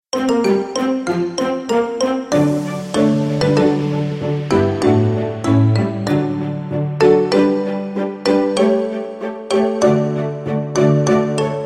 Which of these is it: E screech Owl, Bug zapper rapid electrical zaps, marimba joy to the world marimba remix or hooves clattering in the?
marimba joy to the world marimba remix